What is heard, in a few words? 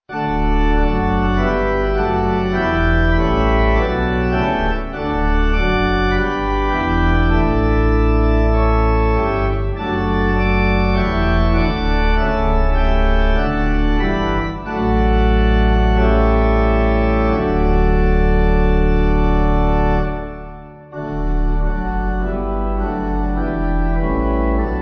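pipe organ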